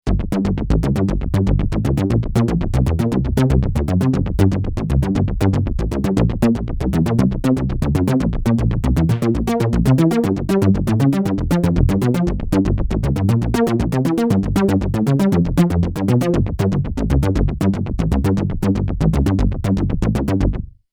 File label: electro seq